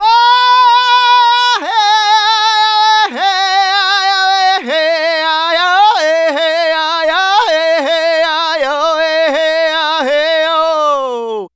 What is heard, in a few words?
Native Vocals, Native American